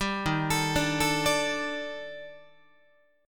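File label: E flat Major Sharp 11th, Guitar; arpeggiated; chord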